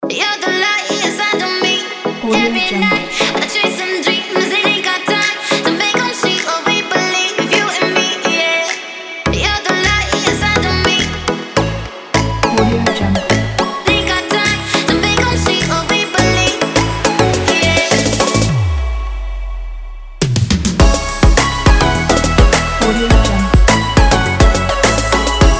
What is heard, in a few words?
electronic background pack